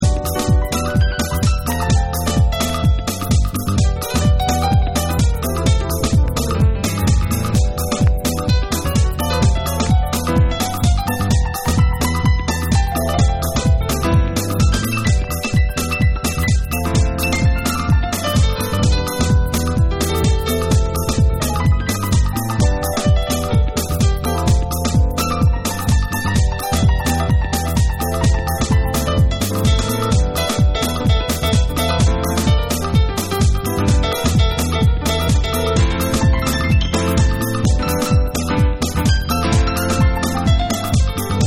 Instrumental

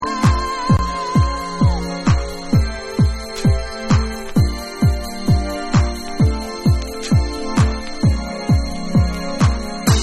Bassline Remix

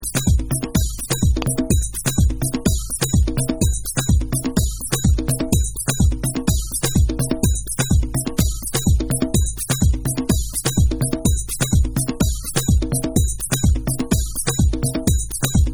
SOME PLACE CHILL Chilled Beats Version

